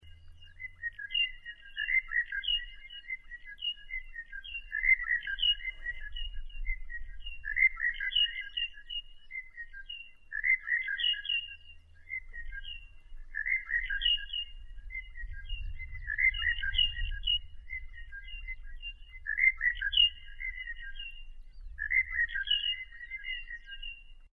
suara monotonus

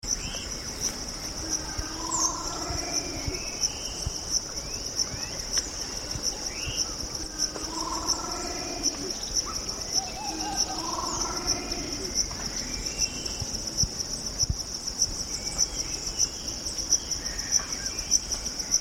maleo

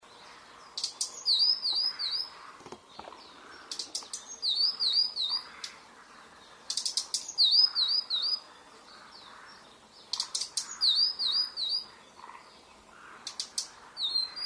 suara betina tledekan laut

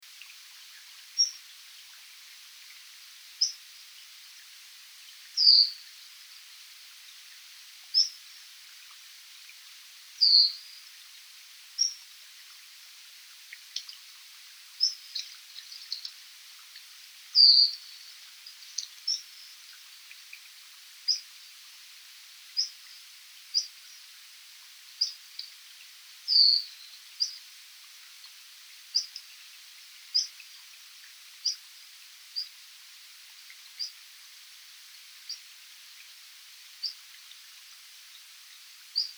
suara betina ciung

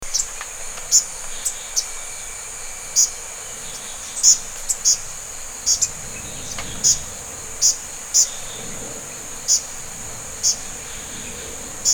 suara burung cabai rimba

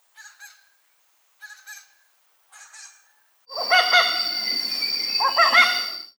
suara kangkareng sulawesi

